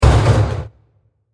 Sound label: Bursting Metal; Bursts; Impacts